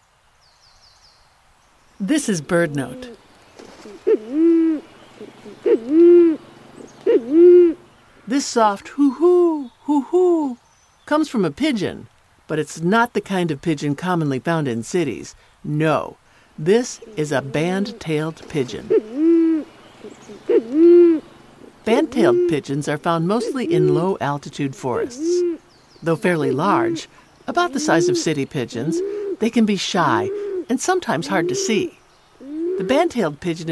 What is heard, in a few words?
band-tail pigeon of the woods